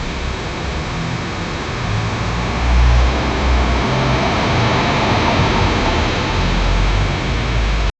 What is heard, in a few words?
a cold rainy night in, alley